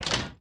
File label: ammo large inventory pickup; factorio 2.0.55